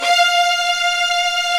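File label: strings, Vlns 8 fff Agitato; Roland L-CD702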